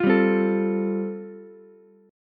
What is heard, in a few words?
G 9th Suspended 4th, Ukulele, C6; G9sus4 strummed